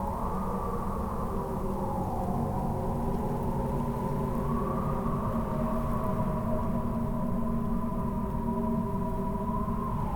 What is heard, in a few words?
ambience Base; the wolfs night